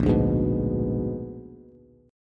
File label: Bb 7th Flat 9th Bb, Bass; Bb7b9; strummed; JGuitar